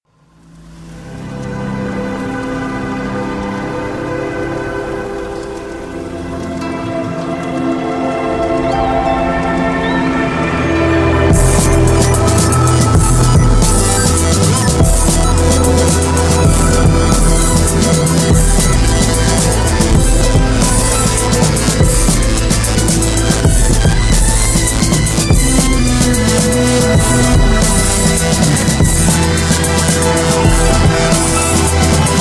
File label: harp